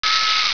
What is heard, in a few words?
zoom, sniper